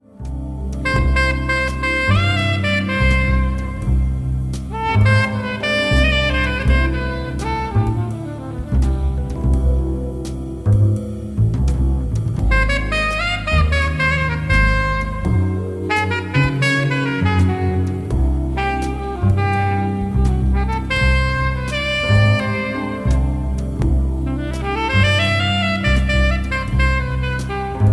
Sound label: Song Alto; Marm bird